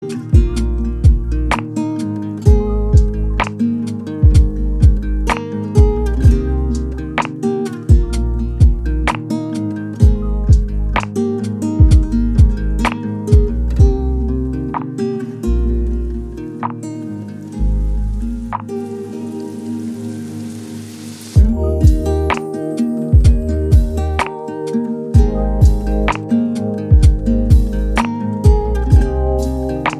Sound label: Lofi Remix; MIUI12